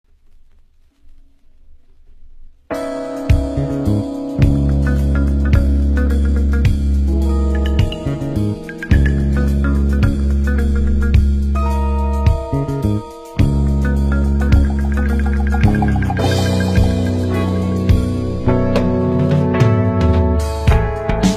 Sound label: Funk, West Coast AOR Groove